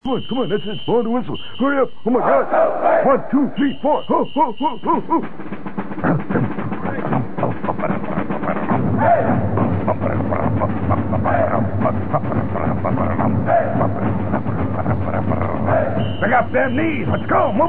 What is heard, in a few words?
fanfare; grambling